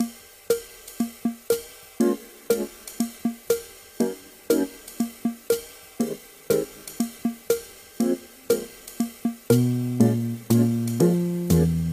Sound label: Elevator Shuffle; human resource machine ost